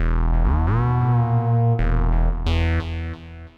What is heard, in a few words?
synth, Hardcore Breakbeat, 134 Emin; Big Fish Audio; Hardcore Breakbeat Action; Mellow Jack